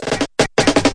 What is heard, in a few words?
roll it in, fills and rolls